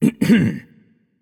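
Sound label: nonverbal clear throat; voicepack; smite; merlin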